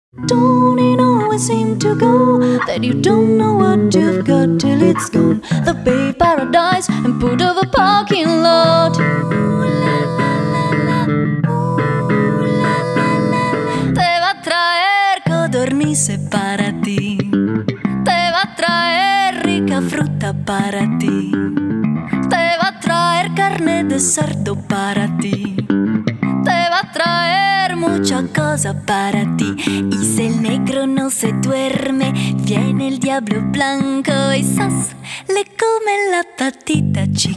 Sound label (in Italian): canzoni